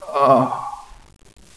death, hostage; cstrike

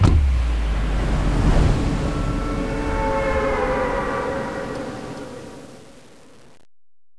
plane drop sound; cstrike; pubg

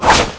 knife slash; cstrike; JailBreak; Rambo

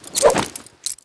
knife slash; cstrike; JailBreak; TribalButterfly

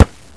kick ball; cstrike; jailbreak

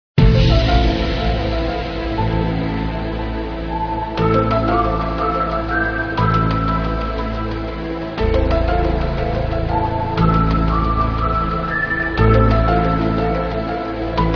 boombox; cstrike; jailbreak

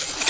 Kar98K, weapons; cstrike

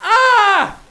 pain, hostage; cstrike

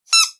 knife hit

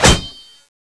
metal, weapons; cstrike

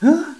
startle; cstrike

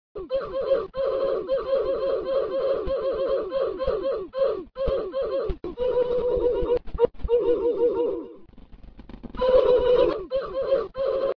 boombox ultimate oof; cstrike; jailbreak

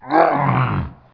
attack, zombie; npc zombie; cstrike; jailbreak

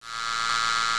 taser stab; cstrike; jailbreak

